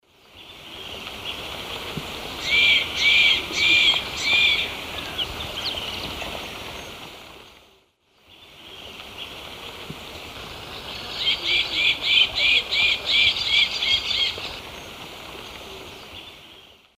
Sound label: Piculus leucolaemus, call; S. America; Eastern Lowlands